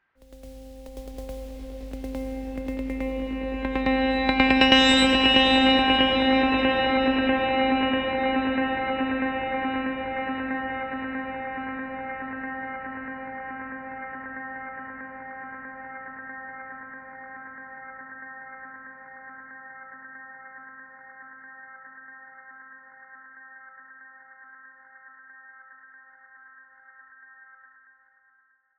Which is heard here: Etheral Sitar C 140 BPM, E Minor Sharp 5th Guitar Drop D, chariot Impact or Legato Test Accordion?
Etheral Sitar C 140 BPM